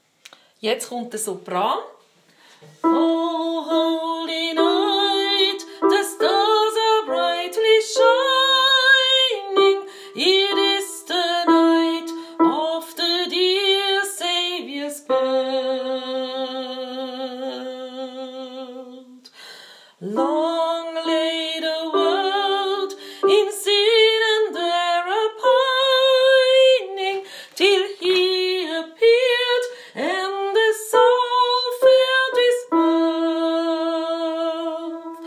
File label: O holy night Sopran